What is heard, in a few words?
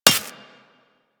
Laser Impact Metal; Surface